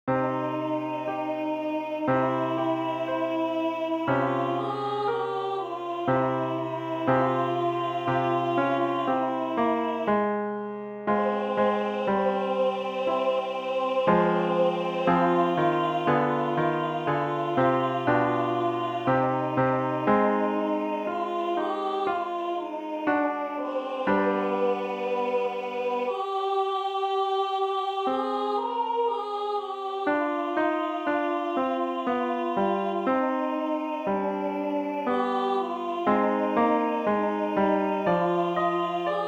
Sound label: adoramus alto